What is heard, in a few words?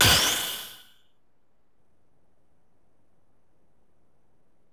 SE GAME SLIDE; maimai